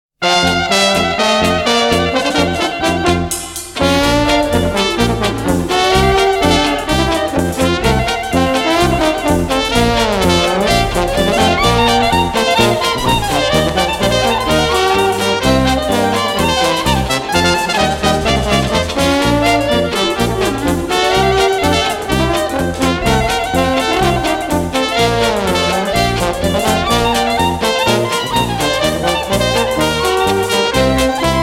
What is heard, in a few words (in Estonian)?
Instrumentaal, Diksiländ; Eesti Filmimuusika; Eesti Kullafond 1